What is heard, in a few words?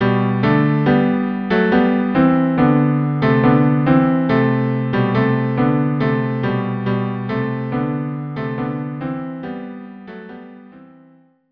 La Bergera cello duo